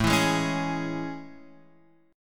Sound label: A Major 7th A, Guitar, Open F; AM7 strummed; JGuitar